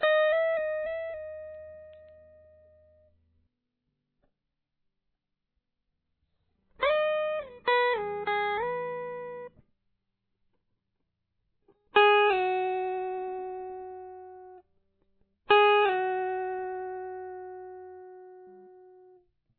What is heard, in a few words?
guitar